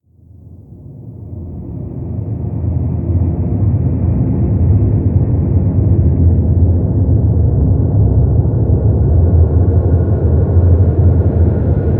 Mood Creepy; steamworld dig 2; Vectron Mood Creepy

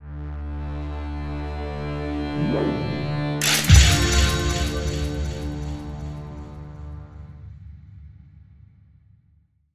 Free For All Victory Sound; Squish

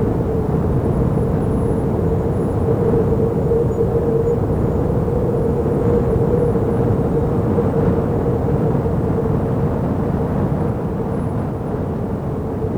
howling wind; gamerip; The Warlock of Firetop Mountain

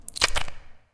Flash Coliison medium

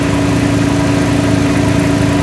Syclone High

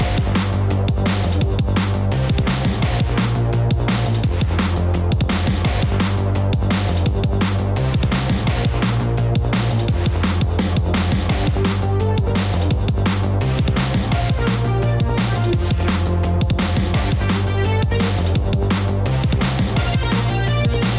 Bass; Metagalactic Bass; metagalactic blitz

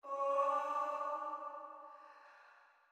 Chime; chime sharp

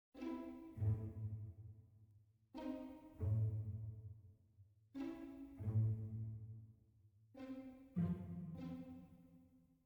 mysterious soft start

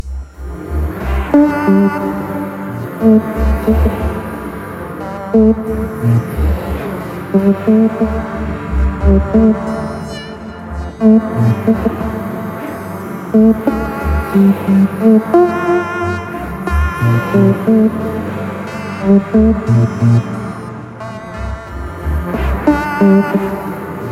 BGM caves; PS Vita

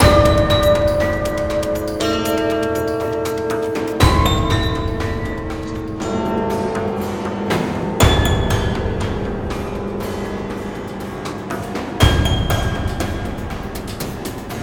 Mus Spiderboss Loop Intense; Chapter3 Glacier

